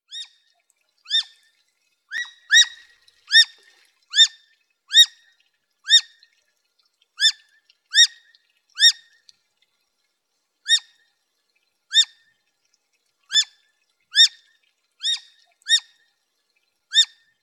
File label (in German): Fischotter Lutra lutra; Der Fischotter unterliegt dem Jagdrecht, genießt aber ganzjährige Schonzeit.; Jagdbar